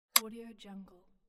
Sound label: button click